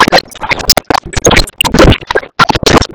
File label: Ambience Thunder Random; Cinematics; Warsongs; Warsong Prologue